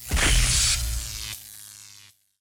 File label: trap laser idle; monster